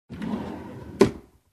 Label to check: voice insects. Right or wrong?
Wrong